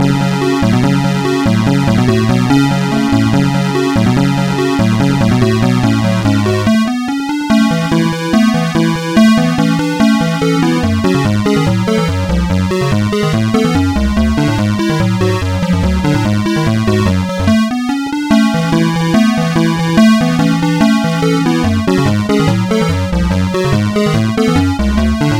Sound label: Sound Test